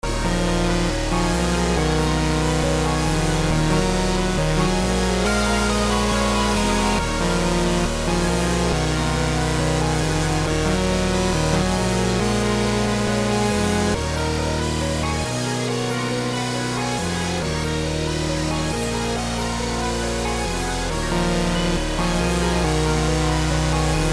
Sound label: wawa loop